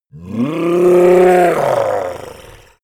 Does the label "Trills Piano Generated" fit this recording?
No